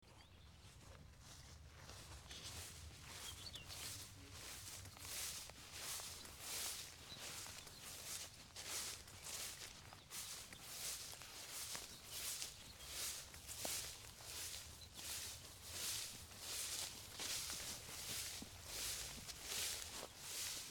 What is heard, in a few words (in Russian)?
shagi trava